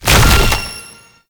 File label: Infested Lich Summon Tentacles Emerge; Warframe; Abilities; Zeke